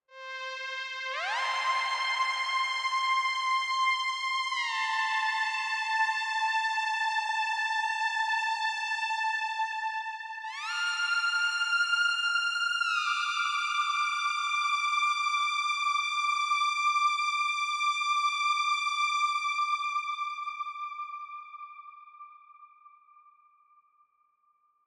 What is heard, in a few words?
Lead Synth; Martian Chess